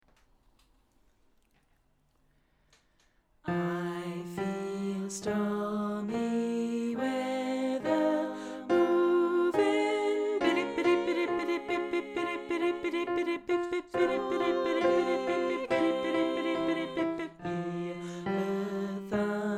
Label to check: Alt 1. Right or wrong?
Right